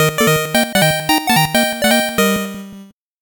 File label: 8bit jingle fast